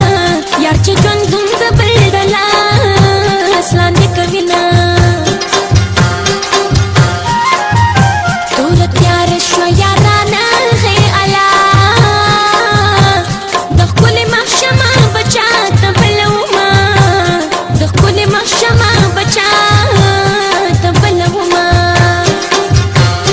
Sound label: pashto songs, ringtone